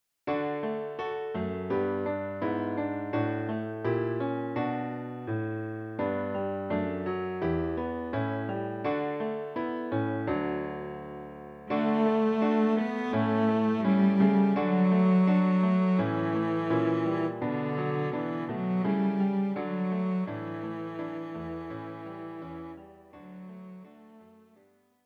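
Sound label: cello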